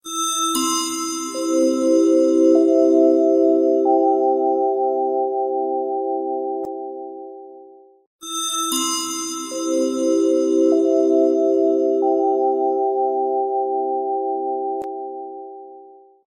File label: 3d message nokia ringtone